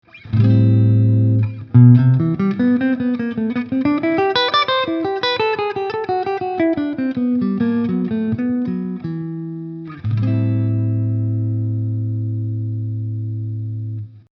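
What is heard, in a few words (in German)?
PRS hals coil split clean; Martino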